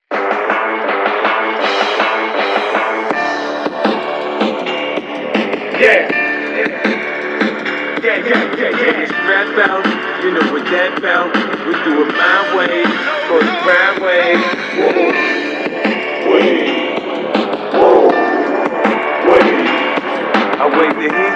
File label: radio music